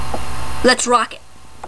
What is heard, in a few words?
rocket